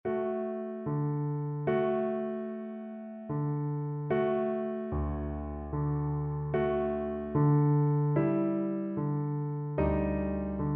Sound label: romanian folk dance, Tuba